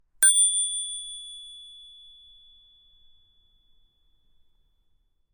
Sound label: Ping